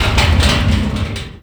Metal Barrel Slide

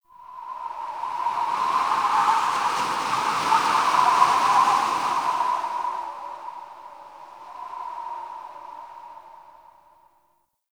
Whistling Wind; Warframe; Tau

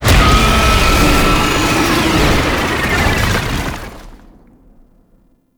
Death; Tau; Twelve Minute War; Cinematics; Dactolyst

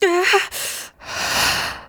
Fight Breath; Cinematics; Warframe; TheNewWar; Throne Room

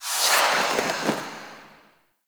Dash Stinger; Warframe; Dante

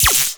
Shock Orb Beam Hit; Eximus

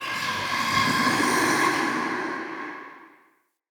Mockery Male Vocal Idle; Enemy; Mockeries

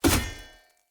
Short Sword Throw Medium Impact Wood Hollow Large; Weapon Impacts; Player; Swords; Environment Throw

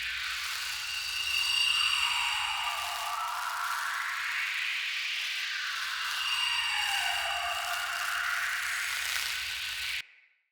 Energy Restore Butterfly Spirit Loop

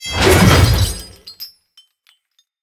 Sword Whoosh Heavy; Weapons; Enemy; Swords; Mnd King Swords

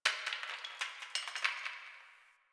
Brick Crumble; Warframe; Ambience; Nineteen Ninety Nine

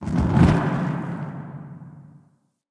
Crypt Soft Flame Burst; Ambience; Champion Crypt

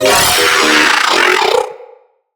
Riot Moa Vocal Death

